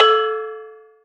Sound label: Metalofone A2; Multisamples; Planet Earth; Digital Sound Factory Planet Earth